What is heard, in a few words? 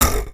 metal